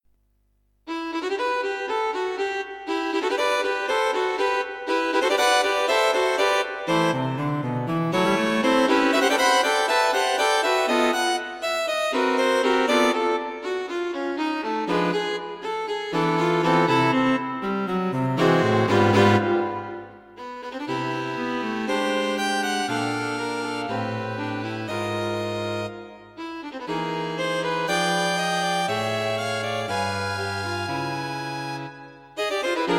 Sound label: String Quartet for Concert performance; A short, work with jazz overtones and modern harmonies.; Fast but not too difficult.